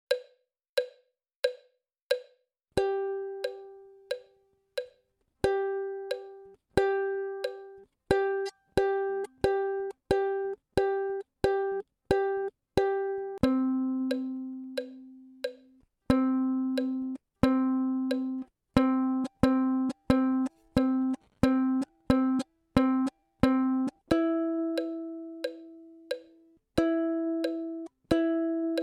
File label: Ukulele BPM90